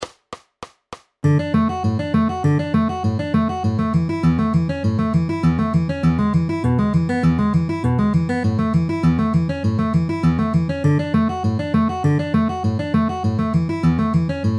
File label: D A E A inner outer ring middle with alternating bass at 200 bpm